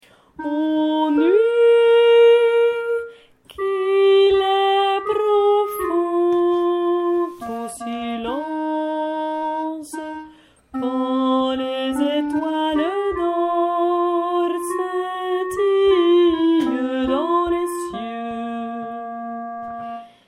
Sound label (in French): basses; nuit